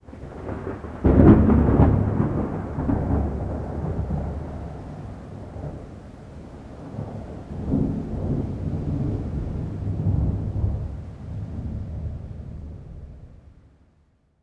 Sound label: thunder med; Rust